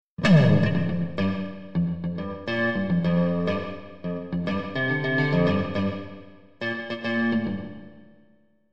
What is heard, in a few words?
live strat jam wet